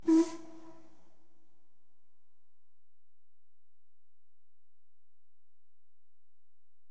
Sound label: Engine Whistle, Steam; LMS; BBC Sound Effects Library; Age Of Steam